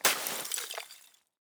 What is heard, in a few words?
ice break